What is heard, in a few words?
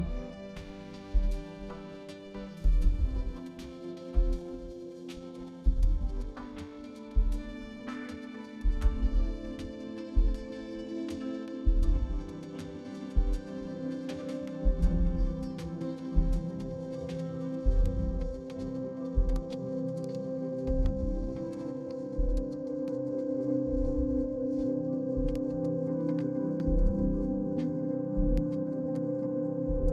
ambient urban